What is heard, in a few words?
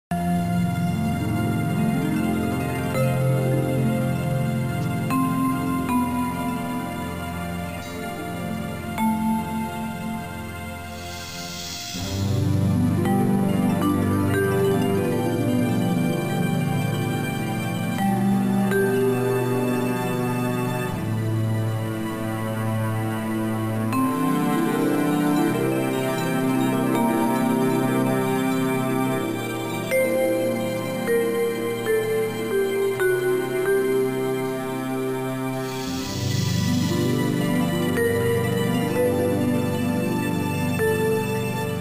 Music Underwater; Spy Fox 3: Operation Ozone